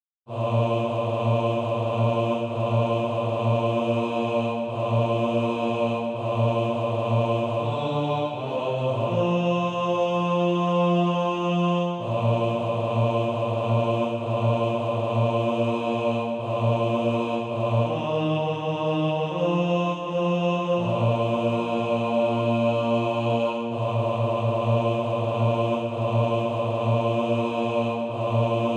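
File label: bass, Practise Music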